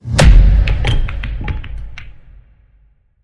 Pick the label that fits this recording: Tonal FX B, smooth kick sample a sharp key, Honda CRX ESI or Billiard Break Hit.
Billiard Break Hit